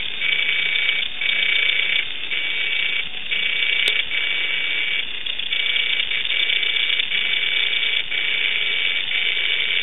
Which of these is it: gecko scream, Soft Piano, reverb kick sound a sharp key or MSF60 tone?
MSF60 tone